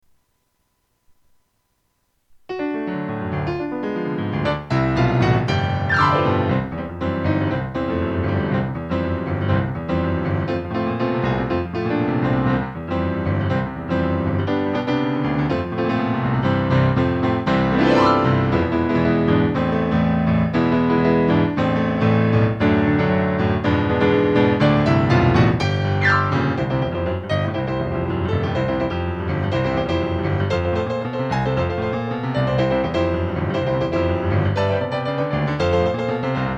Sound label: honky tonky blues